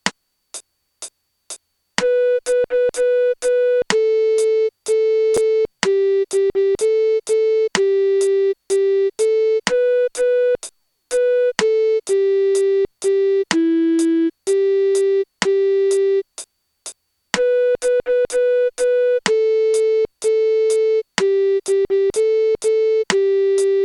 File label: Melody Only